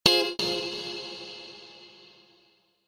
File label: Diffusion Delay